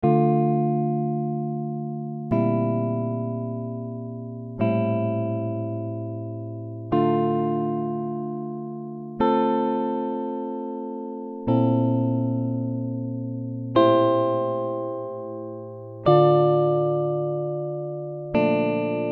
Dm 105 GTR Jazzy Chords

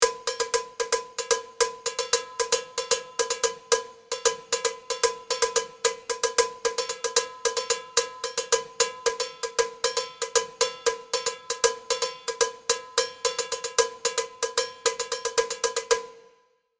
Cowbell Percussion One-Shot Samples; pieropeluche

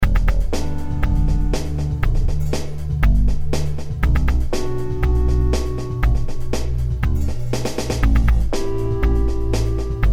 Em120 Linear Funk Groove

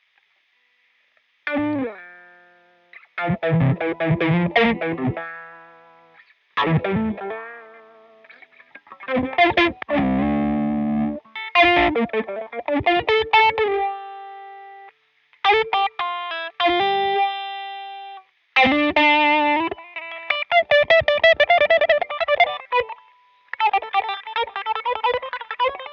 Five-Four Guitar Groove

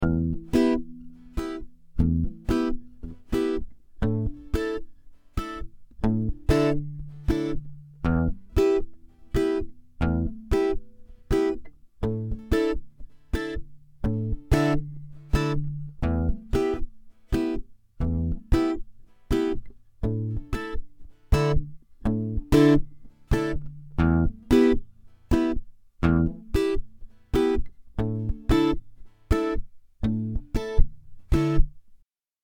Twelve string Improv

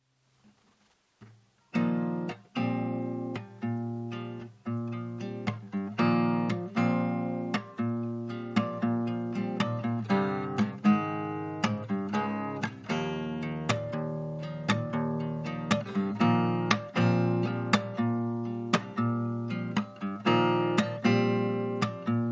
Urban Groove

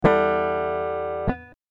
Dm 100 GTR Riffs

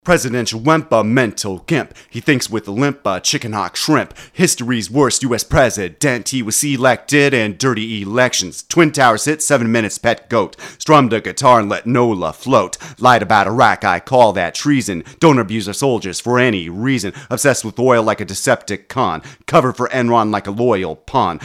111 BPM

